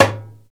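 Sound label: perc conga, deep congas; Roland LCDP14 Africa VOL-2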